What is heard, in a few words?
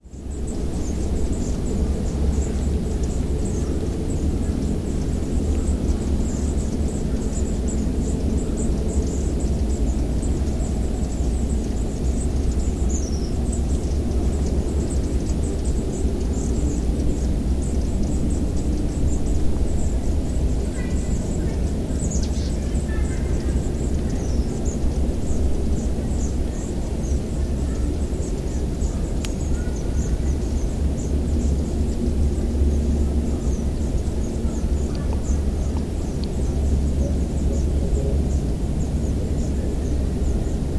birdsong on the great wall of china